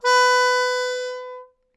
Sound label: Melodica Long, Long Notes Chromatic